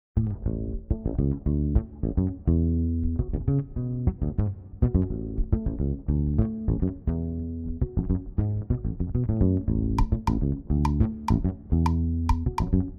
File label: Solo